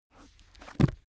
heavy book shut

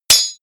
sword knife hits

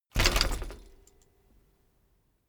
bicycle bike land rattle full

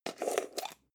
jar plastic midsize open slow

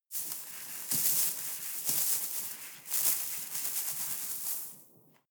snow trickle medium

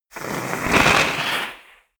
volcano oneshot lava hiss

